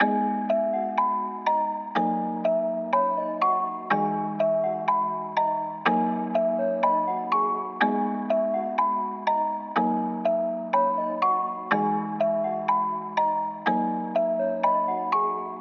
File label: Sad Melody Loop - 123 BPM G# Min; Cymatics; Oracle Sample Pack